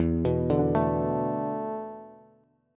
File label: E 7th Suspended 4th, Bass; E7sus4 arpeggiated; JGuitar